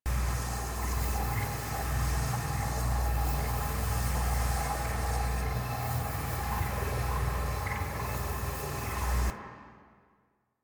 Hardened Wellspring Energy Field Loop; Warframe; Focus; Zenurik

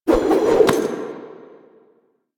Speargun Thrown Reload Whoosh; Warframe

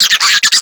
Infested Minion Bark, Vocals; Warframe

